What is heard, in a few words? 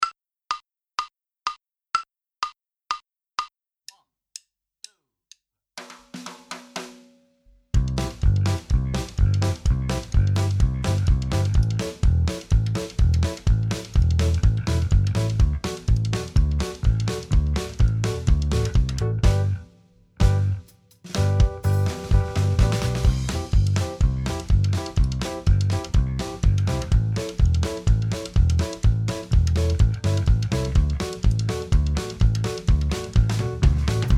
Minus Guitar; Inst Ensemble